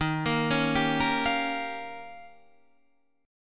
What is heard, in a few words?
D sharp 9th D sharp, Guitar Standard; D#9 arpeggiated; JGuitar